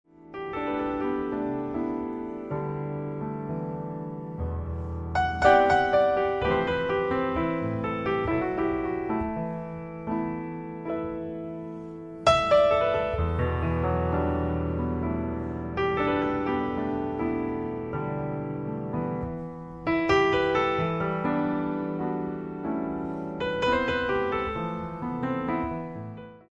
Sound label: acoustic piano